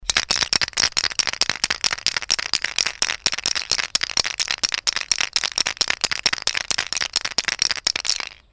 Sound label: Spray Paint Shake; Stephan Schutze